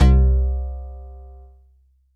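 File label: bell C3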